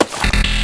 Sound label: keycard